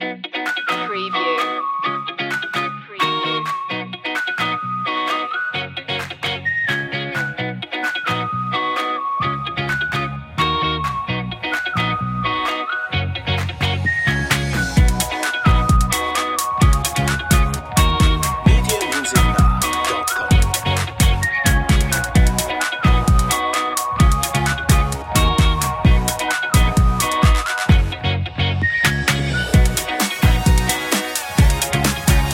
happy feel

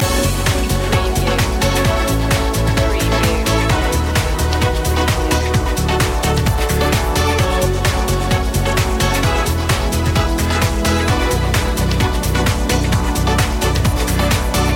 up all night loop

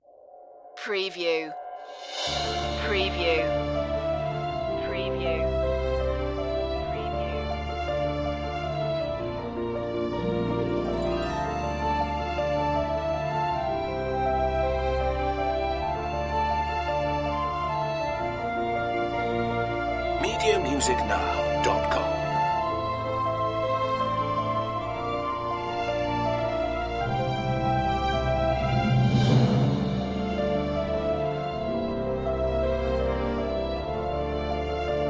no choir version

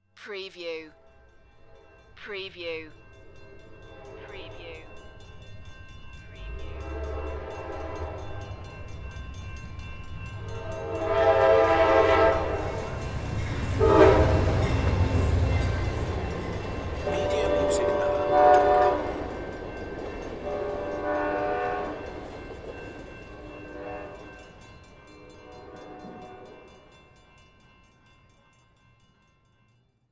Train Pass By Short

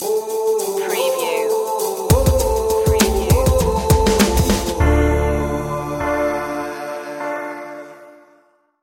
tribal trip hop stinger

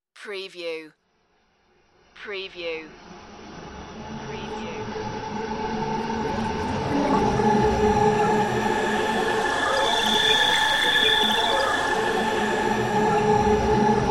digital hiss swooshes

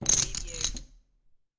Poker Chips Stack Of Chips Dropping On Felt

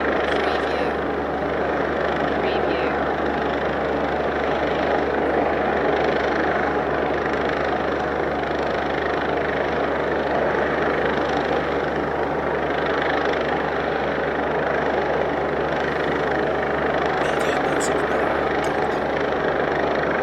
helicopter noise

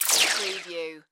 Magical Projectile Burst Small

